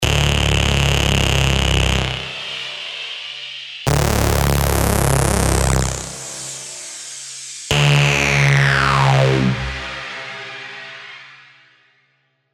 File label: Pitch Swell; SERUM ULT BS